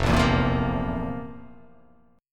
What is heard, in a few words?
F Major 7th Suspended 4th; FM7sus4 strummed; JGuitar